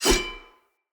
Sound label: Sword Whoosh; Weapons; Swords; Fenn Jotar Sword